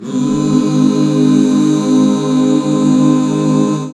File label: Ab 6, back chords; Sound & Vision - Gigapack II CD 1